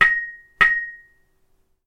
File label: knock on iron tube; knocking